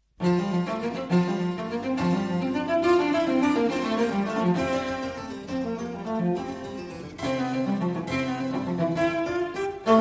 Cello Sonata in F major, Allegro; Vivaldi; Cello Sonata No.2; RV41

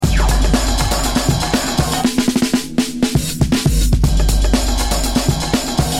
Break

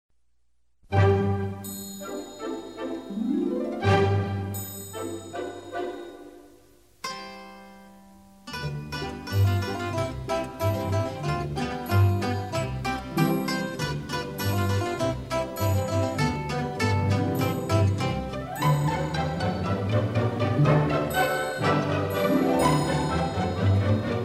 Pizzicato Waltz, Orchestra; Classical

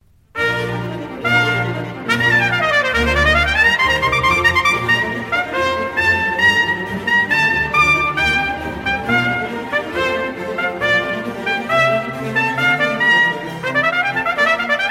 Trumpet Concerto in D Minor, Furioso; HWV. 367a

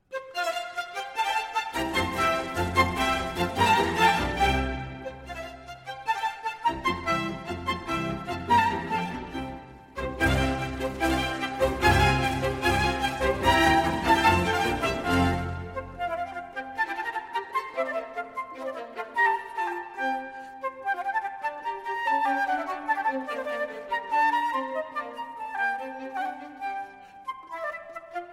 Flute Concerto in F Major, Allegro; Op.10 No. 5; RV 434; Vivaldi

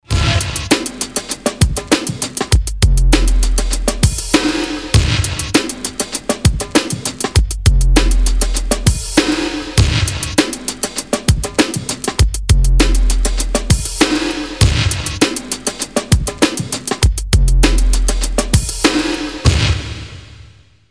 Beat

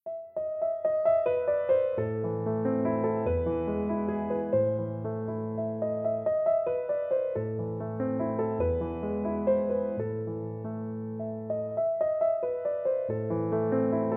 Bagatelle No. 25 in a Minor, Piano; Woo 59 Fur Elise

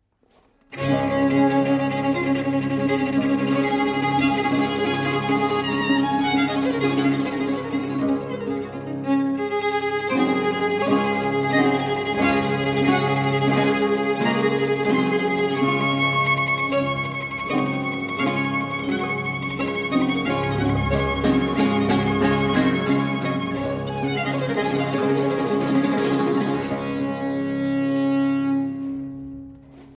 Violin Sonata in D Major, Allegro; Vivaldi

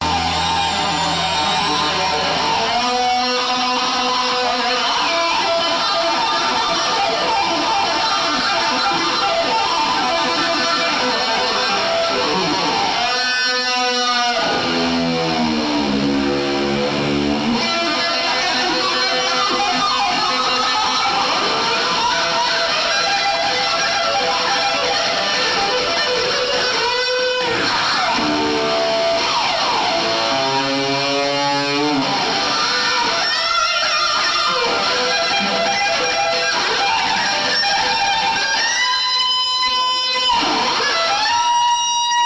Guitar Solo

